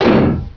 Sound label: THUD, 碰撞声音素材